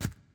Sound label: reel bounce; the wolfs night